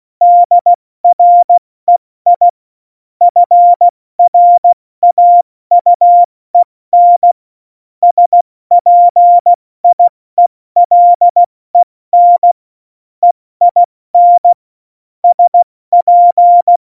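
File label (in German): Witz, cw morsen; Morsekurs; Witze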